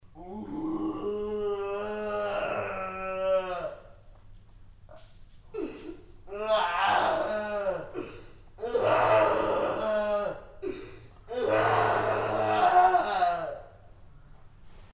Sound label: monster cry